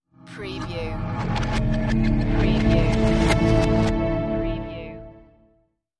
Trailer Production Elements - Shimmer, Glimmer, Glow, Grow